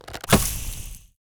Attack Ice Arrow; Game Essentials

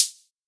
Hat, Afrohouse; Afro Drums; One Shots

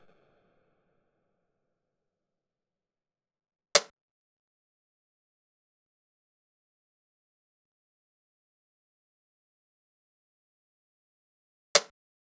Drum Loop, 120 BPM Percussion; Loop Stems; Cymatics; Vibes II Drum Loop Collection; Operation

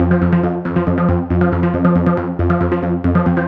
Noof F 138 BPM, Psytrance Arp Loops

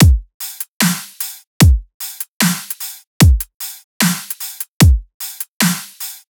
Dubstep Drum Loop Full, 150bpm; Stem Seperated

